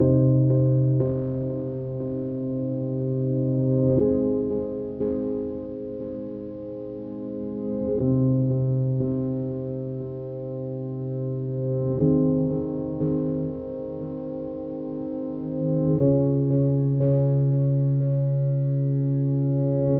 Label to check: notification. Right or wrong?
Wrong